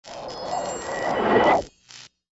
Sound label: buzz word; Toontown Online